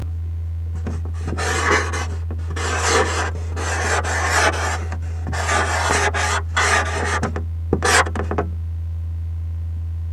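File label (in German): tower filz plaste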